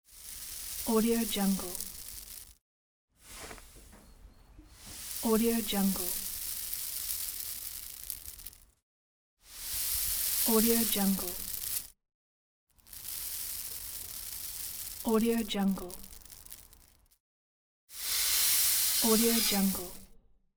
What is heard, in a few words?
grains falling